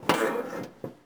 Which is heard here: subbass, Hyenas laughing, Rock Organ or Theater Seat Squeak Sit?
Theater Seat Squeak Sit